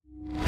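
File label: Footstep Glass Reverse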